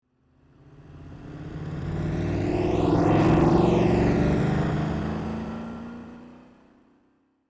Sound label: Truck pass by on a street